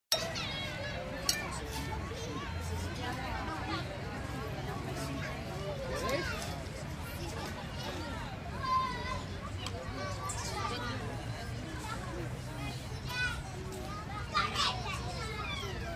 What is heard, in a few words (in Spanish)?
parques juegos infantiles; parques de españa; Rosario